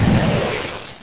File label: message; ABALONE